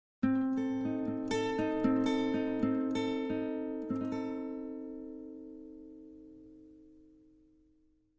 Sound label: Acoustic Guitar High Ton